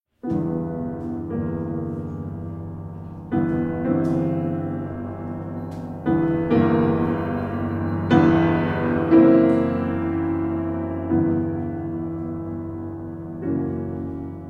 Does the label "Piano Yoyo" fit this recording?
Yes